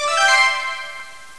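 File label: Congratulation; SingleNumber 1.0.9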